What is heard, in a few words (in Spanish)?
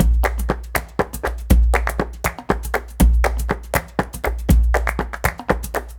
Bulerias 120 bpm